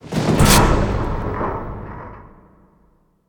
Field Mat Tap; Yu-Gi-Oh! Master Duel

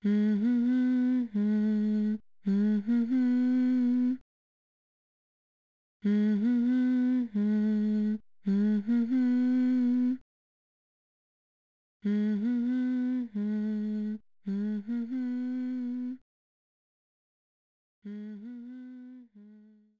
Lullabie; Imagine Babysitters; Imagine Baby Club

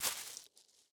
Step Grass; miside demo windows gamerip 2023

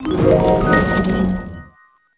Secret Area Unlocked

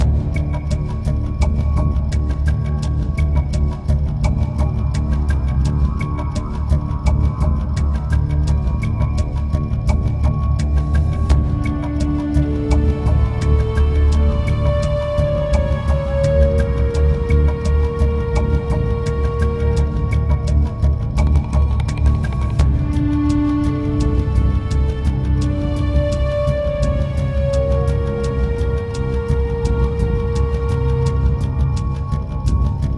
Timer; Mass Effect; Wards Timer

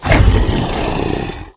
damage reaction

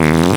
Fart

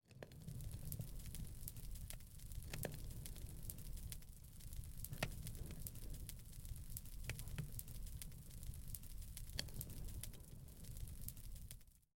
Fireplace; Hornycraft

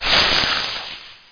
Ice Ball Freeze

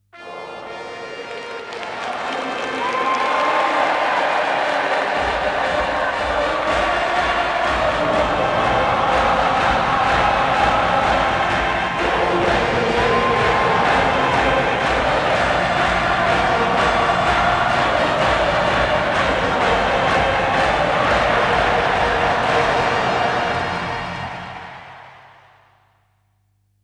Chants U.S.A.

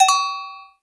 Ui Achievement; papers please; android

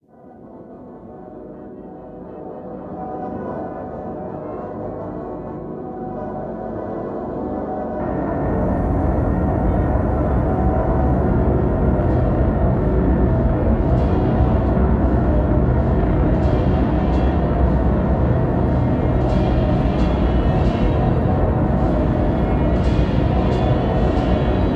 Tension; Detention

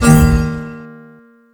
Guitar Chord; gamerip; cat quest ii

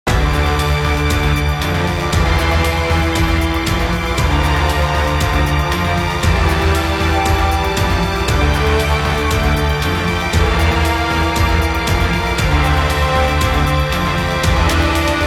Music Main Menu; Mass Effect Galaxy

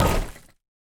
Object Amplifier Hit; pulling no punches

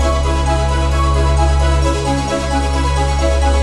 the cave music lilypad; fujii ps4 windows gamerip 2019

